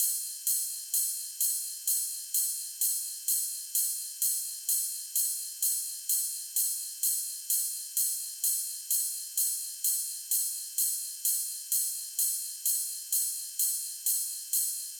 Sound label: Big EDM Drum Loop Ride loop, 128 BPM; Future House; Future House Tools